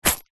purse pouch leather containing coins and notes catch; foley